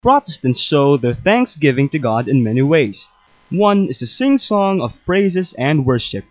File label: GUITAR; Protestants; Major Religions in the Philippines